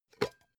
pot lid open; objekte; topf

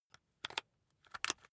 rock drill head attach regular; maschinen; bohrer; soundpool

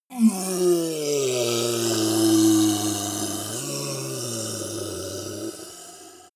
ogre dying groan weak slow

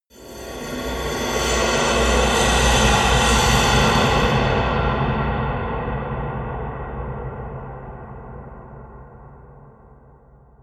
eerie industrial swell haunted; sweeps-swells

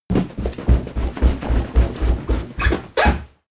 step stair runner

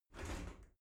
shower slide door slide short close

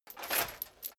metal chain movement on plate single

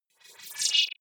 wobble hover swell short

